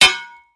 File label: hit